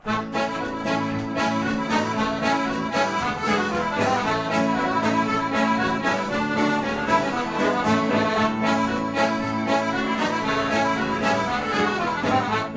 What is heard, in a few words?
Irish Band Reel Live; Glasgow Irish Band